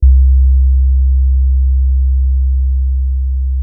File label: Sine Piano C3; Orchestral; FL Keys